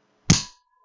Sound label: Punch